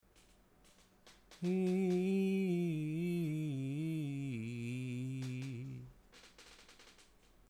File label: Ornamentacion male